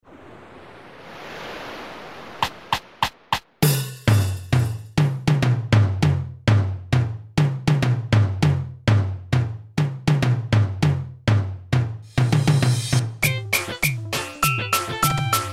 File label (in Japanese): エレクトロニカ